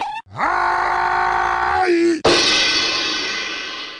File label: pluck heavy scream